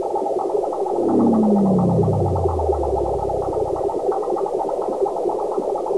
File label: ambience